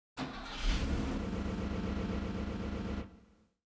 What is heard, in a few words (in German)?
Motor Start